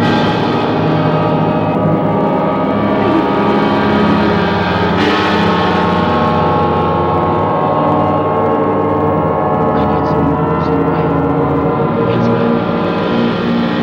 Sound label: sci fi; Sonic Foundry (Sony Creative Software); Ugly Remnants VOL-2; UR 2 FX (Long)